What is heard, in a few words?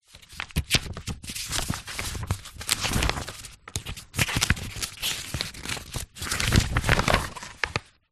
paper document thick many pages search through trying to find page